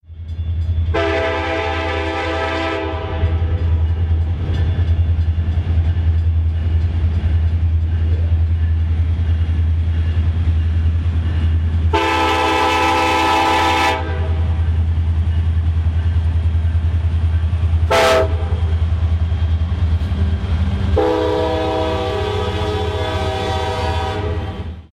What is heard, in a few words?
Union Pacific SD70